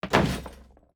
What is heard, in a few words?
zone barricade hit